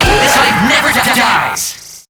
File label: Infested Lich Pain G; Zeke